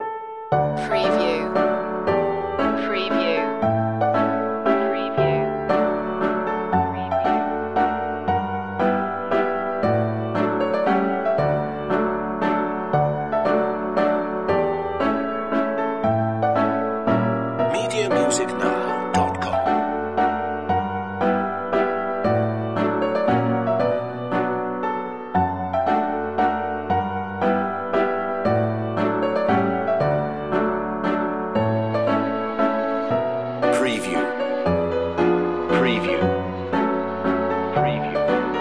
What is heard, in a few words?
german piano waltz